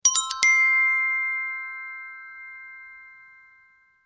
Soft Soothing Ringer Alert

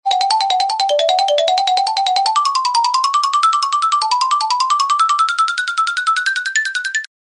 comedy scampering xylophone

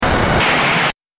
noise phase shift

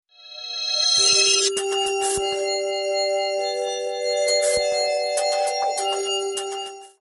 wine glass

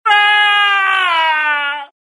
short male yell

